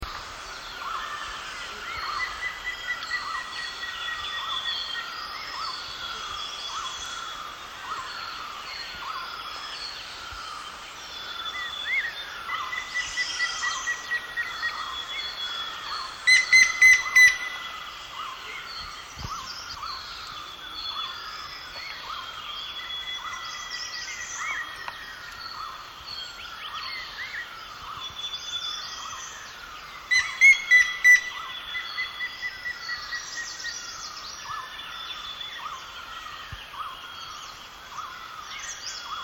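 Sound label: grey-headed woodpecker voice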